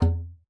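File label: E3, bass flute; Xsample VOL-2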